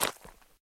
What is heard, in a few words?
gravel step; Golden Crayon Sounds